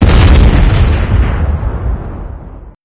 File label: the full throttle shoot